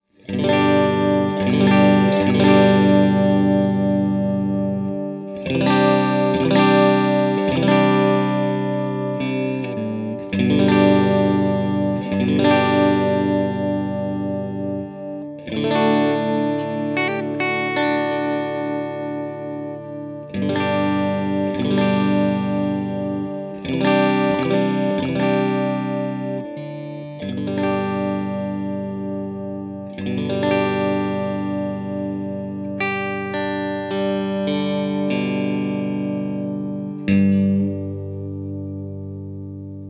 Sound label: Steel Pole Bridge Middle Clean; Revel; Mojo